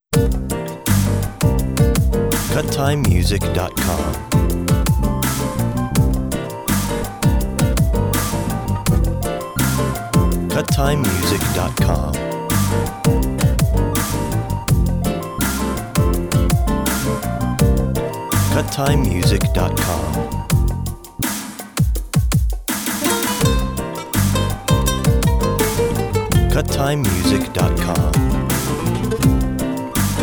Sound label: Pop Bossa In The Club